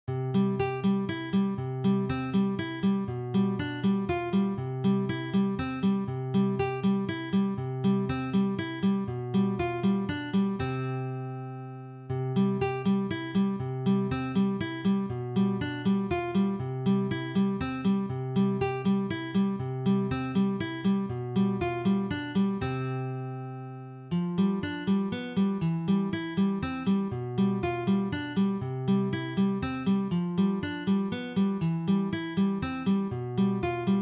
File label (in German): Walzer